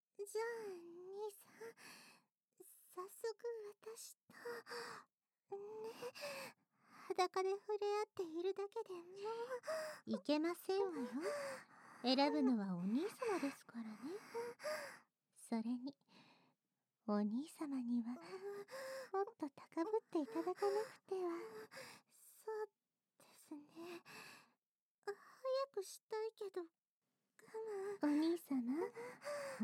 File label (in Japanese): 二人の妹のおねだり両耳舐め